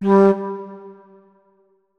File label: flute notes; Hideout Furniture